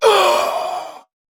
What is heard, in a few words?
Death; Warframe; Dax Ashigaru; Battle Barks